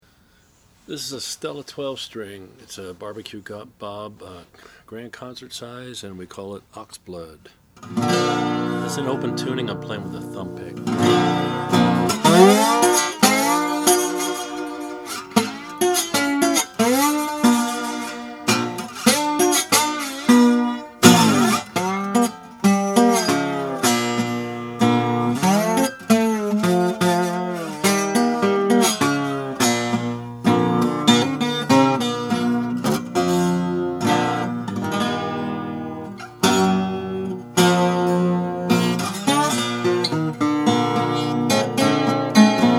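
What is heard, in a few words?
oscar schmidt stella decalcomania, Guitars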